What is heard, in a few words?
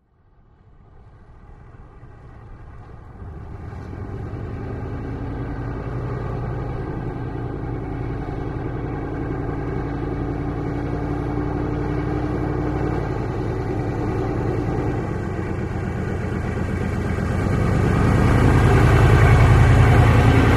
Humvee: Slow By.